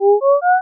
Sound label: start